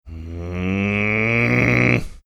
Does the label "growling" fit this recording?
Yes